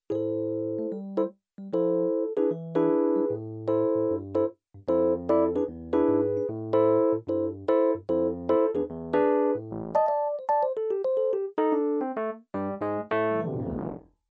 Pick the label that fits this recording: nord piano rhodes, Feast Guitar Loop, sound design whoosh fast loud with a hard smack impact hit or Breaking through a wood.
nord piano rhodes